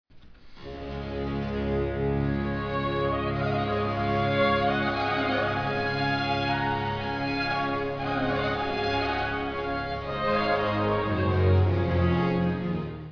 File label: musette